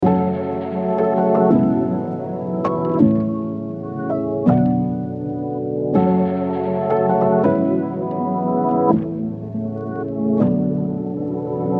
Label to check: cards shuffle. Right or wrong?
Wrong